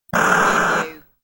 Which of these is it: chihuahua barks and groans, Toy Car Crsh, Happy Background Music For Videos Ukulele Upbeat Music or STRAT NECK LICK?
Toy Car Crsh